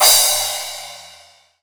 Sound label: Crash Cymbal